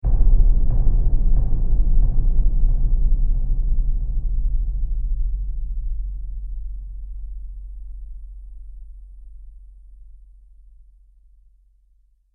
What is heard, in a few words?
Drum Hit Trailer; sound design